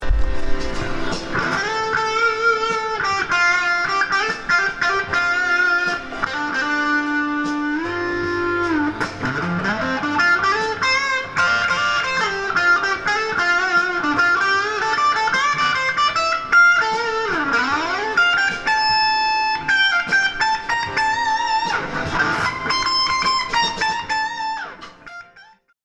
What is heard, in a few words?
guitar